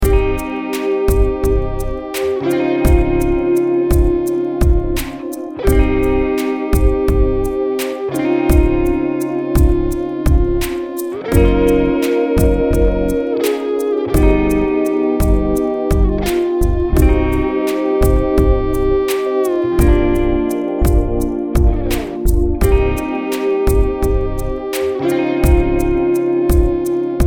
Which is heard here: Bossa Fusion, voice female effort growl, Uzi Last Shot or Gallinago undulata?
Bossa Fusion